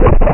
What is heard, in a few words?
droid explode; DroidWars3